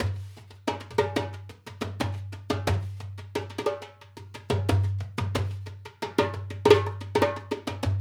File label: Dumbek; Essential Percussion